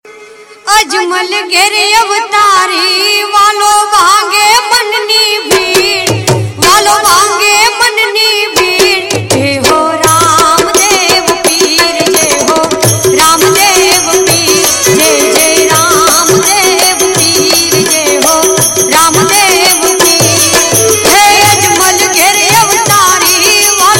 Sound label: Bhajan Ringtone